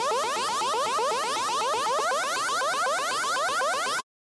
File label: synth finale